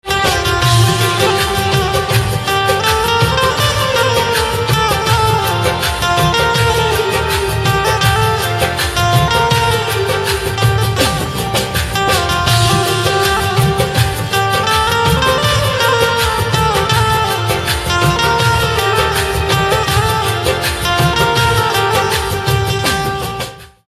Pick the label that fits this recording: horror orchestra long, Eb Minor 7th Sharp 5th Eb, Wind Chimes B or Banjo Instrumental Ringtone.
Banjo Instrumental Ringtone